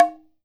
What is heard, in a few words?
OKAWA RIM, JAPAN PERC; AKAI S6000 CD-ROM; WorldSounds